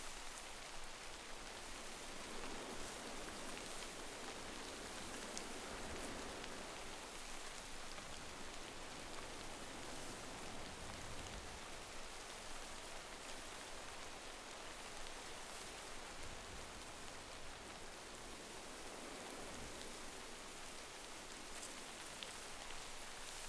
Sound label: outdoor mountain road day rain; anemoi